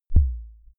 sub kick clean

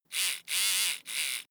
servo sequence

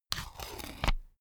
notepad sheet tear off

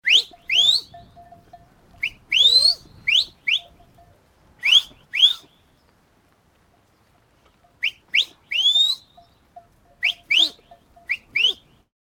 goatherd whistling

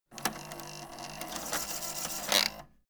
servo move

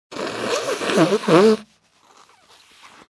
wheeze

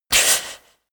inhale female

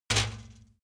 trash basket metal hit soft

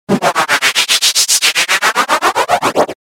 move fluttered noisy flanged

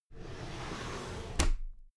drawer cabinet paper open regular

